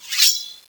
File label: pull out